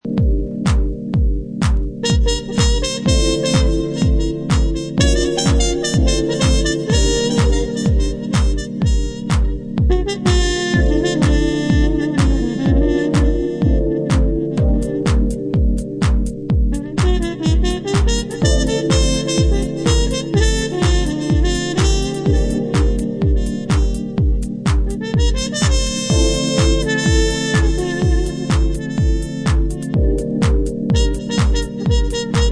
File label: Jazzy Horn Dub